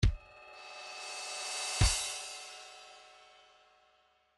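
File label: trance midi loops fills cymbal roll; Newtronic Trance Constuction Kit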